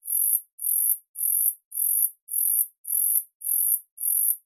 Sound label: Animals, Insect, Grasshopper